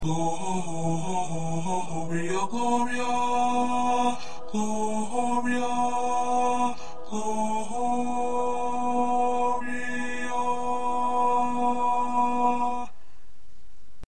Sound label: Baritone